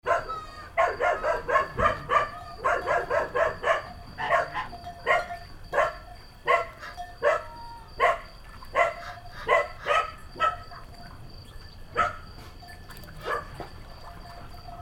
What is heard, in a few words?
goatherds dog barking